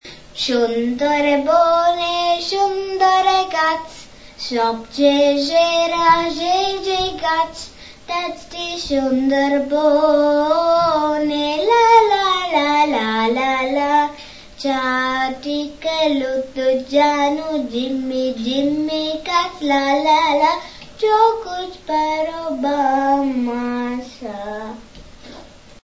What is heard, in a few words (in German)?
Kannada Lied